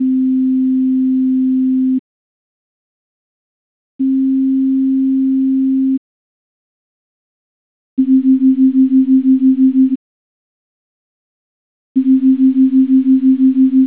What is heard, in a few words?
beat demo; There are two pure tones near middle C which are 6 Hz apart. First each tone is played separately using then left and right stereo channe